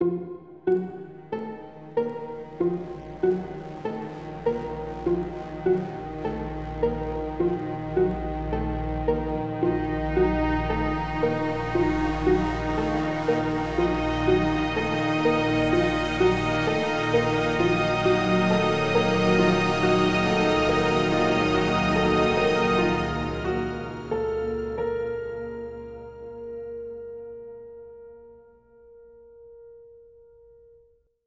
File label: Dramatic